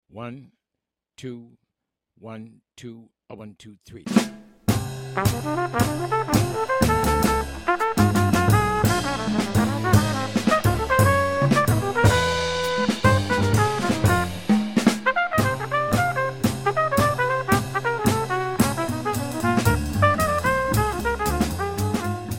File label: minus piano